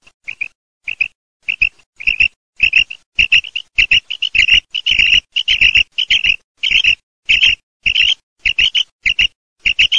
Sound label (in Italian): QUAGLIE